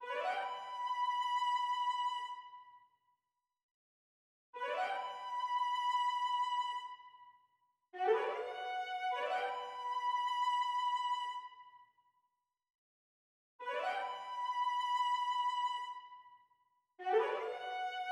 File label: 106 BPM B Min Violin; Cymatics; Destiny Gold Expansion; Exotic